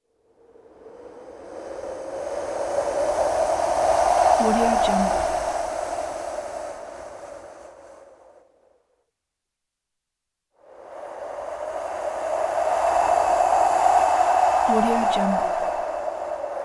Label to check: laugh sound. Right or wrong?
Wrong